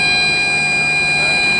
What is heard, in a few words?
low rotor rpm warning horn; heli; r22